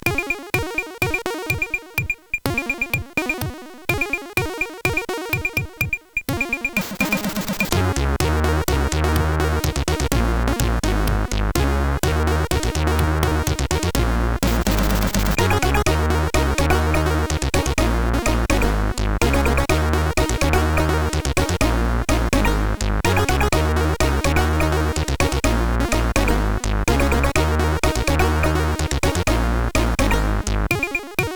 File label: Rasters, sid CSG8580R5; Lethargy